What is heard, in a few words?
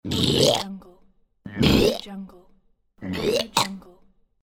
vomiting